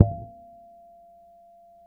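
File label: F 5, fretless harmonics, bass; Spectrasonics; Bass Legends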